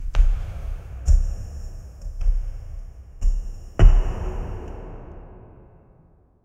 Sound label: high heel footsteps in a, cave